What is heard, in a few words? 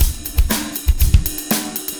Breakbeats loop, 70_s Breakbeats